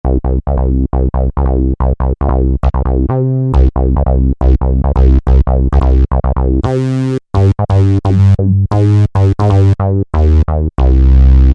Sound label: Cyber Bass; Roland SR-JV80-11 Techno Collection